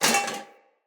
Foley Armour Step B; Enemy; Mockeries

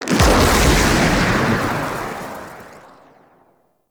fire trail cast impact; Warframe; Nezha

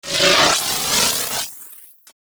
Missile Swarm Proj By; Crew Ships; Vehicles; Weapons; Warframe; RailJack; Corpus Crew Ship

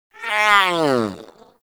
Kitten Yawn; Warframe; Catbrow

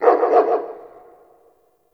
Distant Ambient Dog Bark; Ambience; Warframe; Nineteen Ninety Nine

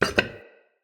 Vase Medium Impact; Vases

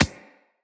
Beach Ball Bounce Dirt

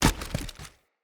Tree Dead Small Destroy Chunk

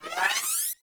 Biped Railgun Charge; Warframe; Corpus; CorpusRailjack

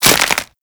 Stealth Neck Snap; Warframe; Characters; Tenno

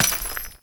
Jester Step Dirt, Footsteps; Foley; Warframe; Grineer Royal Jester

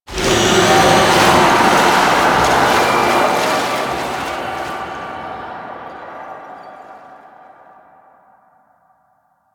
Hex Melt; Warframe; NineteenNinetyNine; Stage11FuturePast

